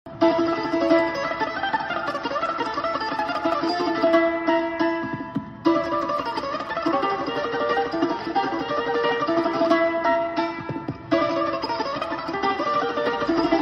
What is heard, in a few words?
balalaika